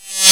BEEN REV, PERCUSSION REVERSE

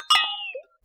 glock fx; Univers Sons - Raricussions

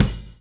123 groove; AMG Gota Yashiki Groove Activator

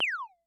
Multi, Vermona DRM1-MK2

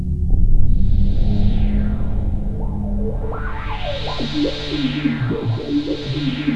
EQINOX A1; Rubber Chicken Software Sample Bank

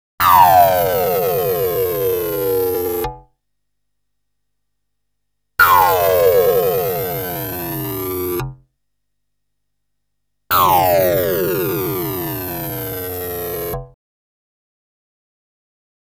Scanner Guns; Bizarre Planet

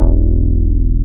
BAS MKS-50, Roland

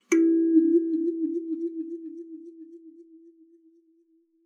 KALIMBA E4; Xsample VOL-7

